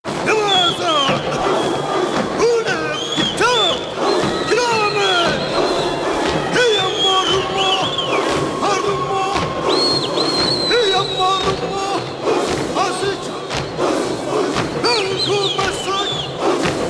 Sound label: Chant; Zulu Chant No. 5